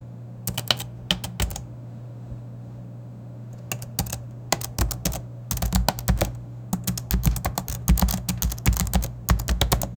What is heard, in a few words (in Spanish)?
presionando teclas de un, typing